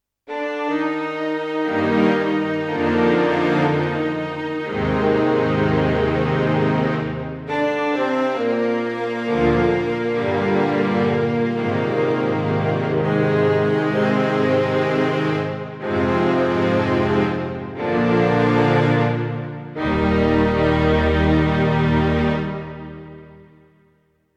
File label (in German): Korg Pa1000 Orchester